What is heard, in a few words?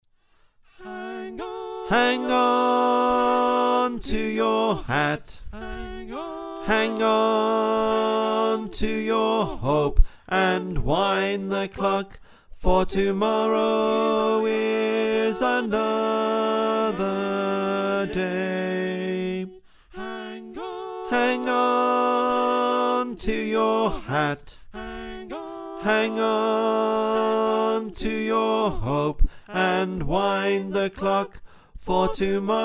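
Bass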